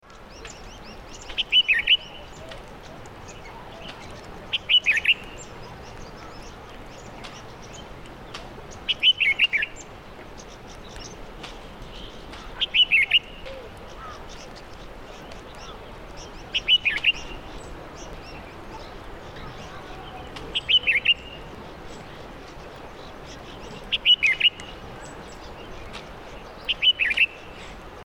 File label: Himalayan Bulbul